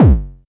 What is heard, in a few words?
909-ish Kicks F#1, Tuned Kicks; LIMAT Div Dept; Drums